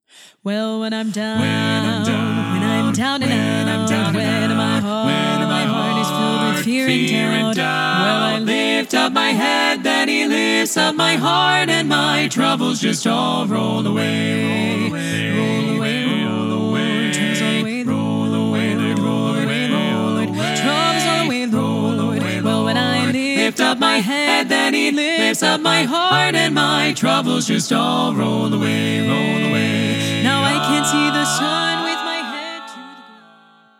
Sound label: SATB